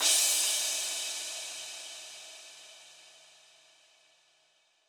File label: Crash 138BPM, Drums & Perc; F major; Vengeance Samples; Another Day Kit